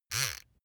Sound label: razor clatter single